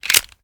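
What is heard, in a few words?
pistol reload xmag mag in; gmod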